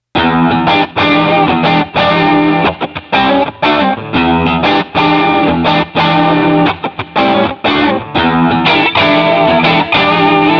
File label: Blues Rock Groovin Party 120